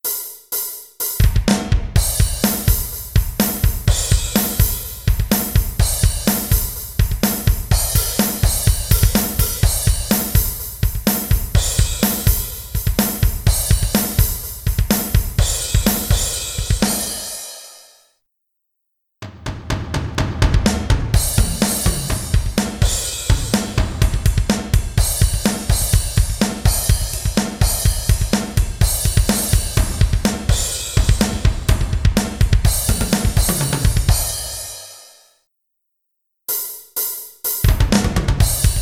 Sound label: drums; brick and mortar; golden corral